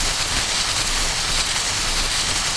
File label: Flare On